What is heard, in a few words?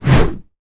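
virtuoso sword strike during training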